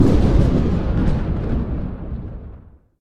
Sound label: thunder